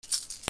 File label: MONEY